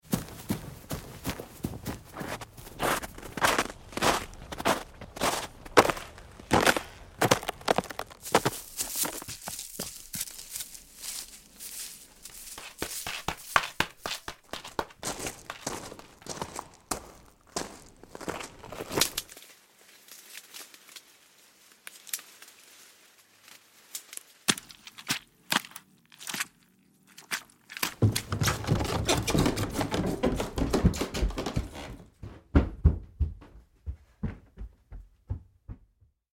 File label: Footsteps; Big Room Sound